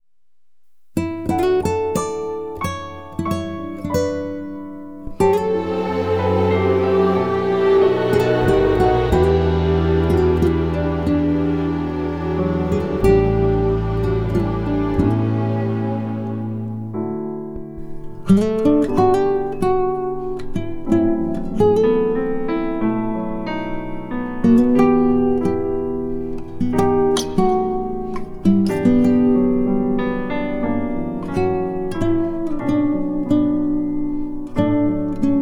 Guitar Ver.

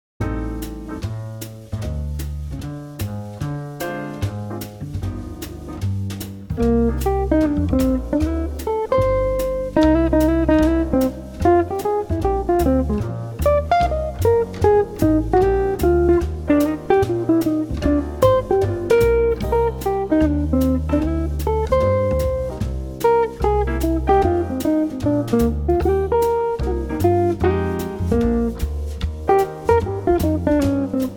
Swing

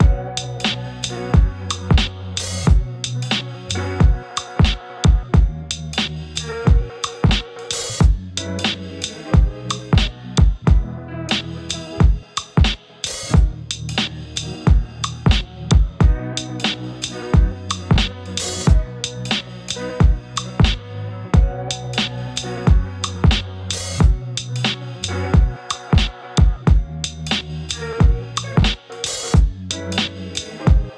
Lo Fi Chill Jazz

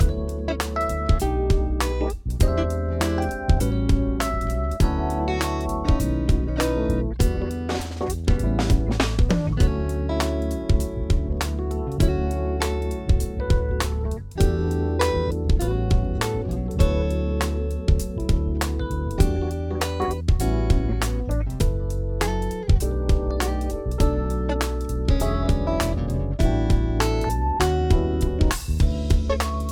Erotic Jazz

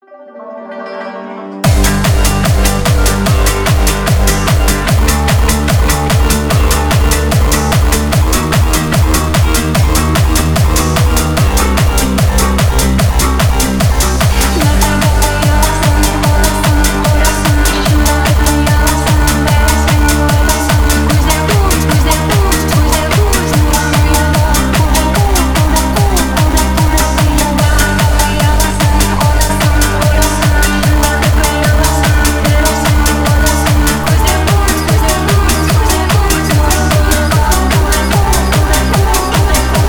hardstyle remix

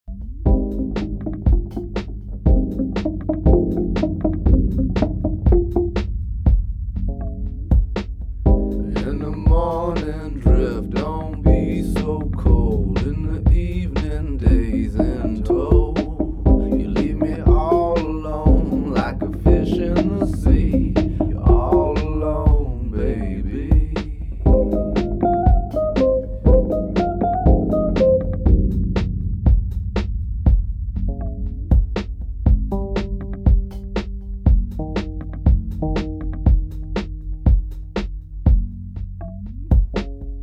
Blues Band

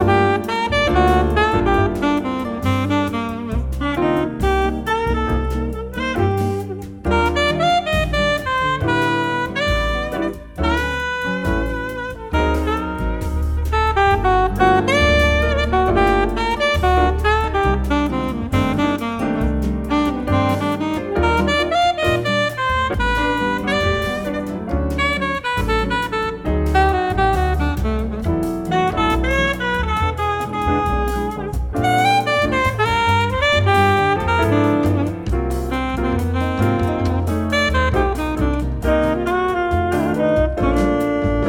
Brazilian Background Music, Bossa; First Dates

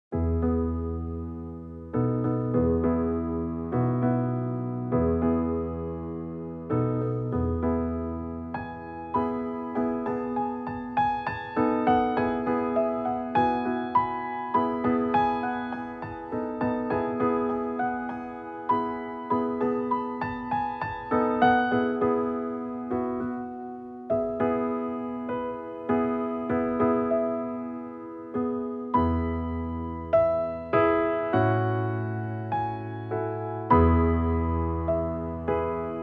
Gongs

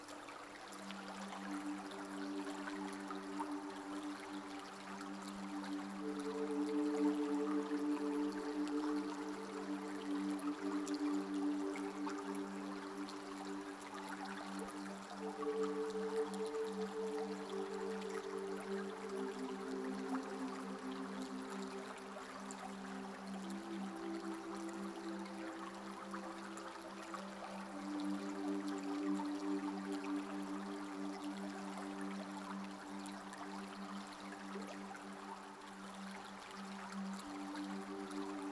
Sounds of Nature White Noise

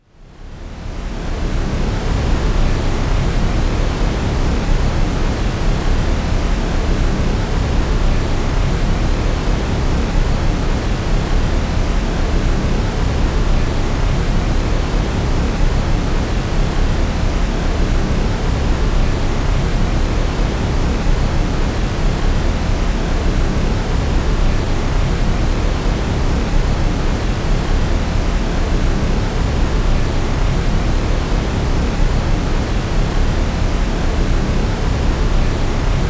Organic Master Noise

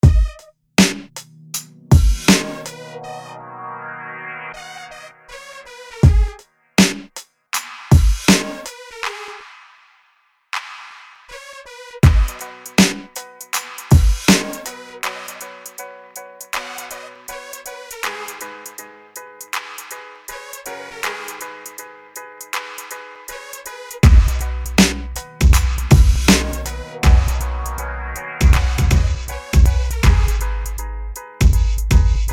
beat

